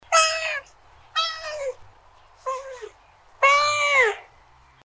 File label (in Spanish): gato